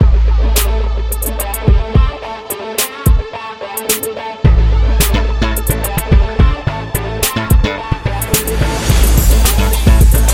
Hip Hop Beat, Epic hip hop beat background music that blends the intensity of trap drums